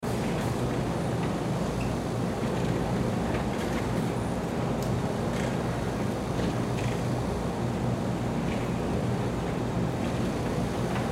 Store Entrance Shopping Carts Vending Machines Loop; Stores And Mall; Ambience; Blastwave